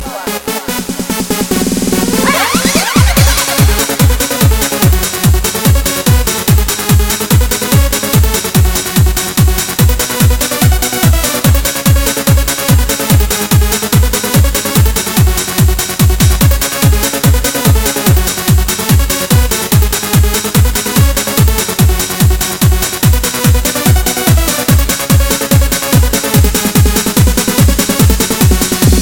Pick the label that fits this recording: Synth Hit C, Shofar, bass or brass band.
bass